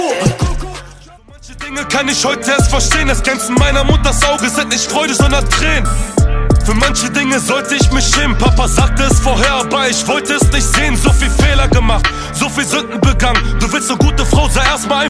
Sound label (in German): deutsche rington